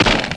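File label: shotgun; Total Chaos Nukem 3d Pack; duke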